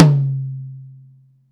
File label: TOM, Drum Dry; East Collexion; Drum 1